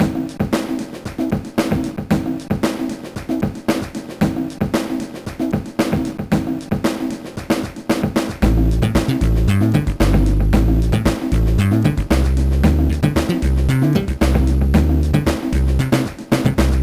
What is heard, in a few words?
funky beat; Trackers; Modland